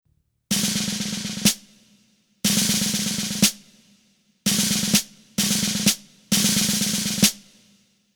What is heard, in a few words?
sharedrum; Snare Drum; instruments-of-the-orchestra; Percussion Family